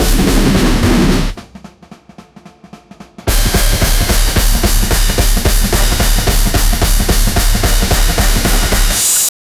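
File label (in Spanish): flauta sola, latin; la diablada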